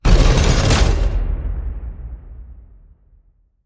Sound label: Castle Gate Slam